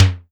SIMMONS SD-5 drums

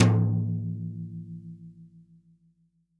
TOM, REAL DRUMS, SWING KIT; Best Service ProSamples vol.43; Real Drum Kits

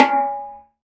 Bongo High, Roland BK-5; Percussion

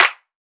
Clap 808; CYCLOTRON; 808Flex

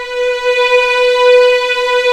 B4A LEG F, VIOLINS; East West; The Ultimate String Collection

